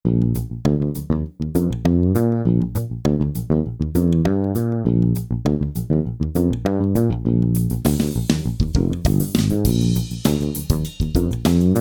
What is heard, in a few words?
Fretless